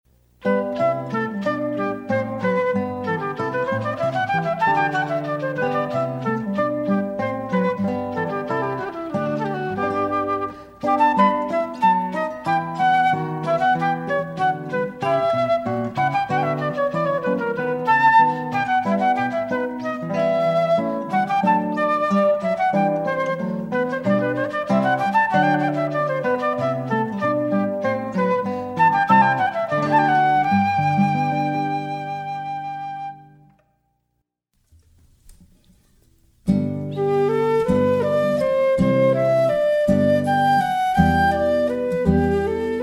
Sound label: wedding classical guitar with flute